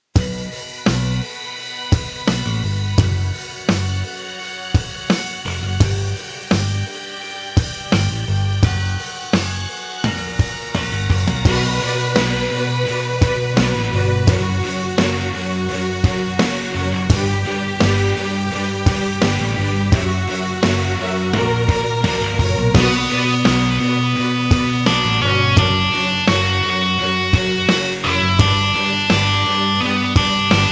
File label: slap